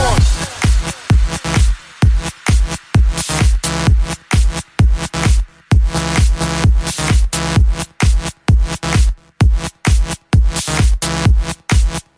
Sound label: electro dance